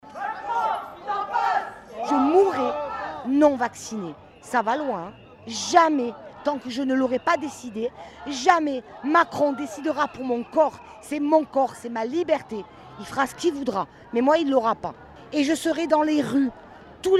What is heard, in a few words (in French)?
ambiance manif